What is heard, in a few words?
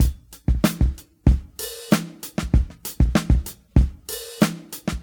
95 bpm seventies fusion drum loop b key, Free breakbeat - kick tuned to the B note; drum loops